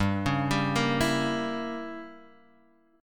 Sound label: G Diminished 7th G down Guitar Open D